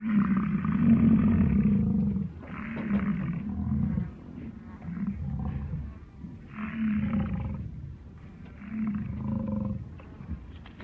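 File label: bison voice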